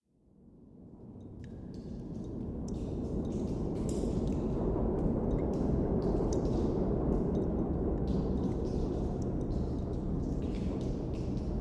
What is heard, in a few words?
water train tunnel ambience sound design